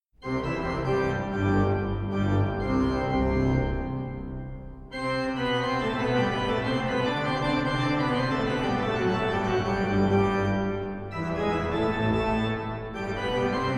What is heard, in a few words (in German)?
PRAELUDIUM C DUR; BUX WV 137